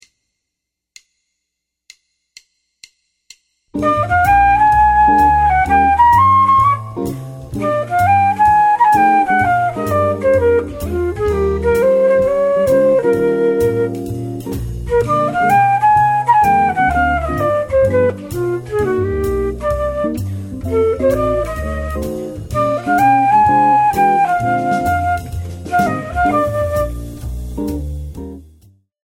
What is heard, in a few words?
blues